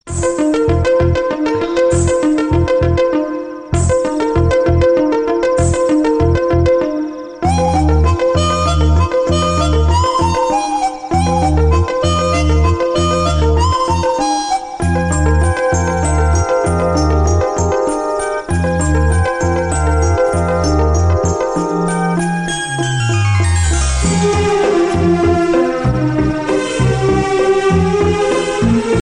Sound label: malayalam romantic tone